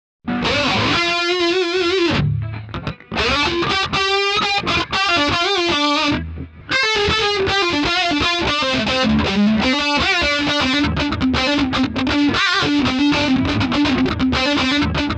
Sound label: Guitarsolo; AtomicReverb